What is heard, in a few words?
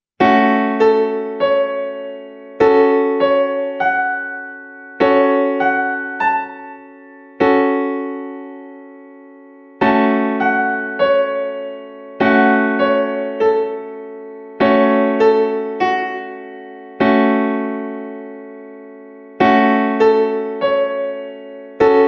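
F#m Gbm Piano Keyboard Chord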